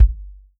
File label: REAL DRUMS POP KIT